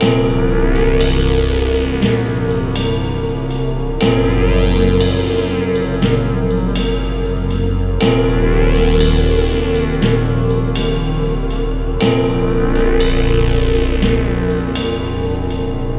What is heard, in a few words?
dark analog